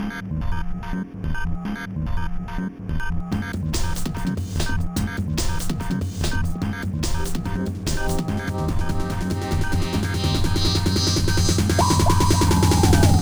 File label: Decimater, Hardcore Breakbeat 145 Cmin; Big Fish Audio; Hardcore Breakbeat Action